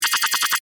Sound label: Scanner Read Out, Scifi; Science, Fiction; Tech; Sound Effects:Science